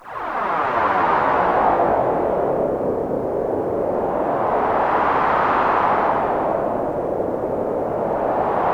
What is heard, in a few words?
wind, analog synth; AKAI S6000 CD-ROM - Volume 6; Snth FX; ANALOG SYNTH SE